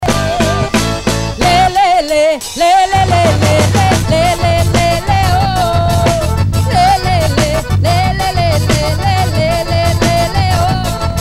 festival de quadrilha arastape junino

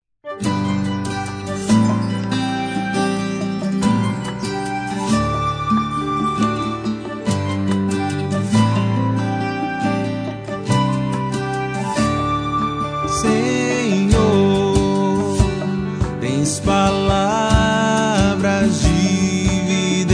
acustico salmo 18

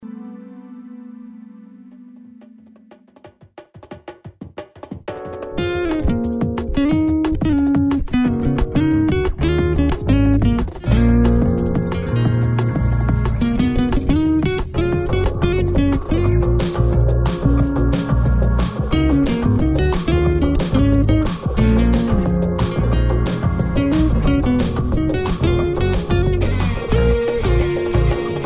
vinhetronica

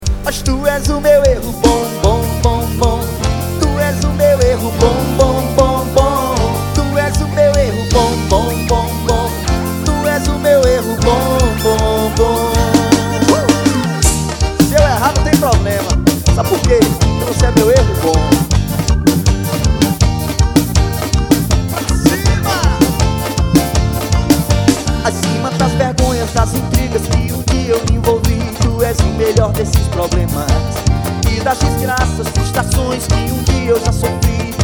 carnaval; carnaval 2013

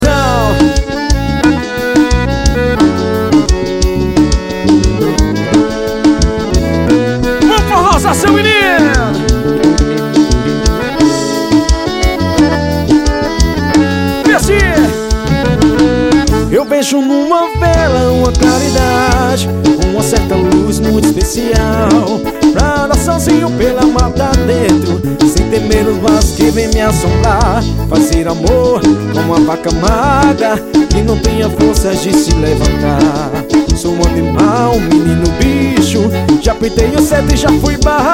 forró ao vivo; caipiras show bar; cuite pb

